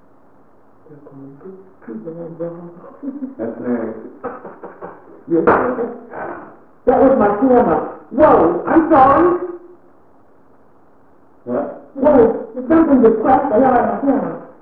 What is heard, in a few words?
camera slap; Sound Anomalies; Olympus Digital VN-1800; Lost Church